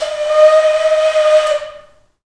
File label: AF FLUTES, Heart of Africa